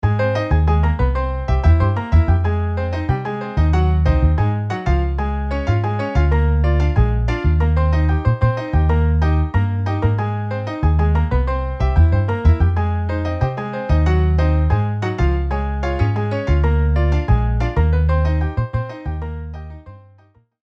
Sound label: Piano Bass MIDI